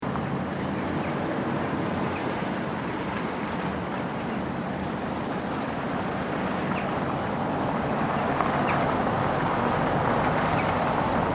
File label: Medium heavy street traffic with birds. Some high squeals from cars; people passing on gravel; Occasional crows caw; Traffic And Car Sound Effects